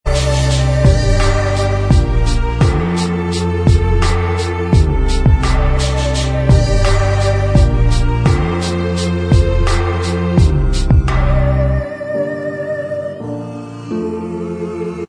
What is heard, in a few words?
Inst Track